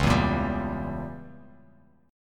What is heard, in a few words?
Db Diminished 7th Db down Bass Standard; strummed; JGuitar